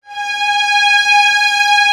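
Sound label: Vio legato G#4; Digital Sound Factory Virtuoso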